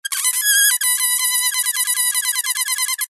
foley balloon deflate squeezed valve squeak high pitched; zapsplat